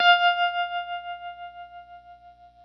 GRTCH TREM F4; Sonic Reality; American Heartland

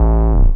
sub A, techno trance bass

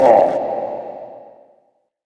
vocalizer; Sonic Foundry (Sony Creative Software); Ugly Remnants VOL-2; Beatstuff

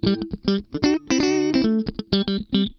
Funk Guitar; 90 s Sample CDs; Zero G

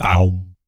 BS, Vocal BASS FX; ILIO; Vocal Planet VOL-3; Jazz & FX

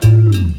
Ooo; Zero-G Jungle Warfare 1, 2 & 3

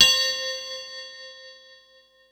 mallets

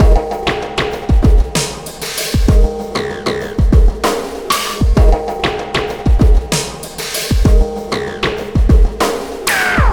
Sky Lab Beat, Processed Drumkits

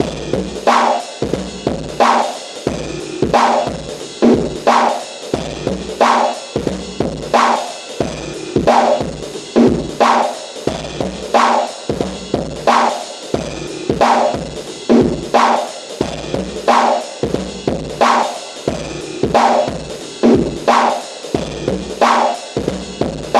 FUNK, Funky Xtreams; INIS

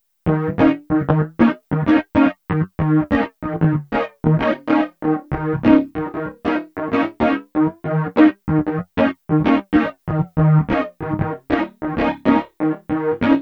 Stabs; O Be Have Stabs; Sonic Foundry (Sony Creative Software); Electro Lounge; 095 Get It Done

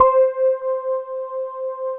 CRYSTALS

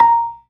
PERCUSSION, ToneWheel Organ; E-MU Formula 4000 Series Vol. 3; Analog Odyssey

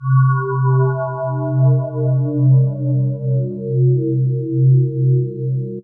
Pad; Dream Zone

